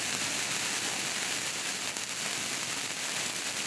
fuse; MexicanaChillitime

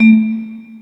Button; Twingo; ClubFusion

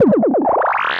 Spin; OverTheBrink

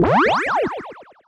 Big Points; World Of Sport Quiz

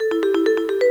Wheel Spin; ShowtimeClassic; ClubFusion

